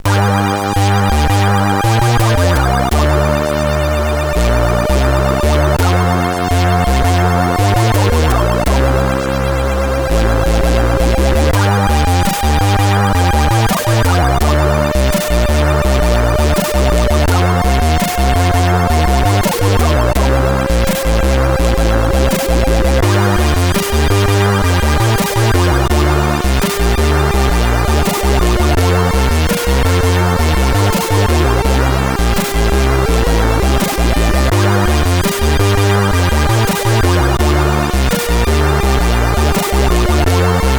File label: Easy, CSG8580R5; sid; hvsc